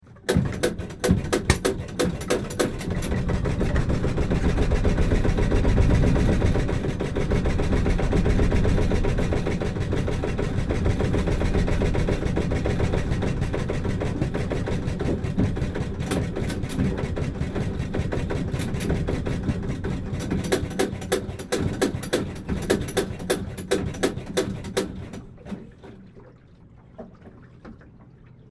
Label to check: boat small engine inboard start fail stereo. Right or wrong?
Right